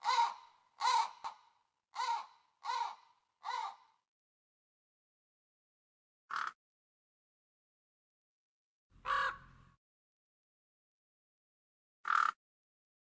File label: raven; Minecraft; Votive Content